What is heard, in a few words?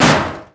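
bouncy metal trashcan hit; bouncy objects; Tony Hawk's Project 8; XBOX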